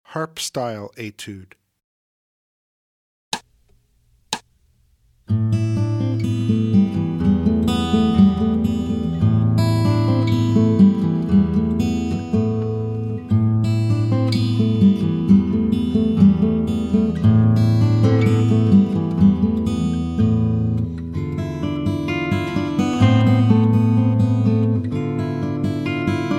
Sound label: Harp-Style Etude